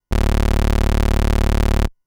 Pulse A D0, keys; fleet keys Samples

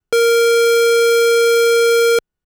Sub A#5, keys; fleet keys Samples

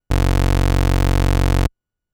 Pulse A F#0, keys; fleet keys Samples

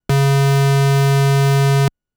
Square C#2; fleet keys Samples

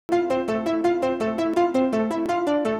Reverse

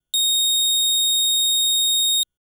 Ramp A#7; fleet keys Samples

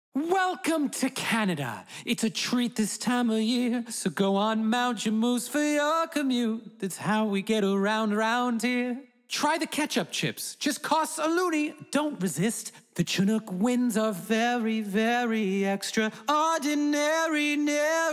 Acapella

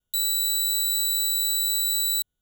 Pulse B B3; fleet keys Samples

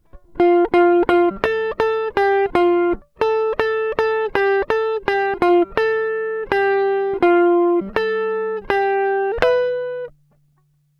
gtr trem

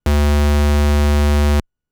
Square D1; fleet keys Samples

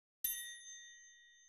Triangle

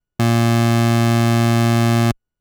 Pulse A A#2, keys; fleet keys Samples

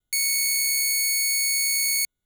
Pulse B C#6; fleet keys Samples

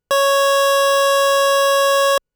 Pulse A C#4, keys; fleet keys Samples

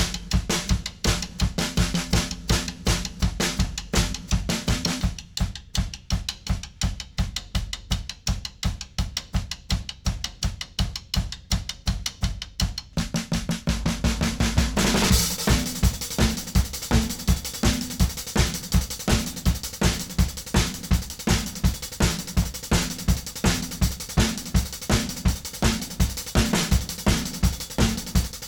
drm Rhyt

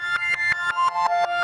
RVRS

